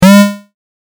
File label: game effect